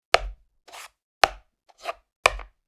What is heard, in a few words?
Checkers game placing piece wooden board; leisure